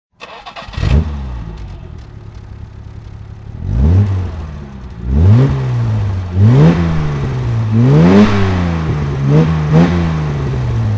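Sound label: jaguar etype 42 ots s15 throttle, Engine sound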